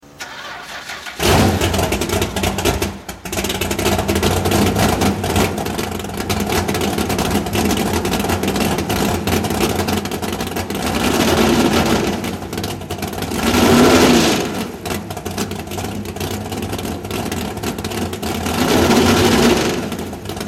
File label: Muscle Car Engine, 1970 Plymouth Cuda Drag; Ramchargers